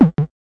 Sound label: silly elec fx